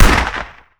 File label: pm md90 fire; STALKER